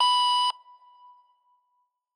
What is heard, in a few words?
racetrack start; teardown; ps5 windows xbox series xs